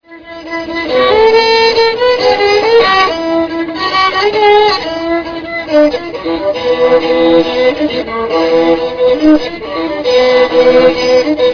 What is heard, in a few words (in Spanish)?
seque seque, Laúd, violín; SEQUE SEQUE INSTRUMENTO CORDOFONO; Koberuna, Departamento Antonio Díaz; FUNDEF